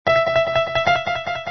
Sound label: piano